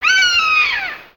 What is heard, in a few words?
Bird Amazon One shots; Lego; ambience